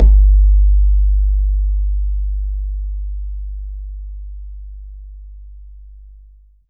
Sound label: BAYAN, Drums Percussion